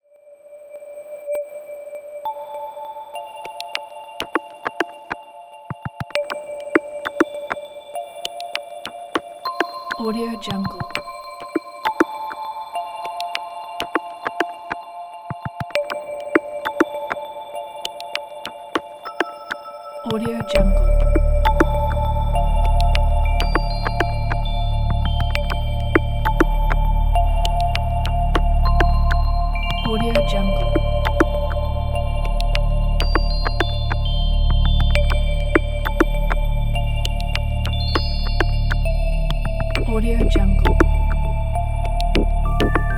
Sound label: minimal tech background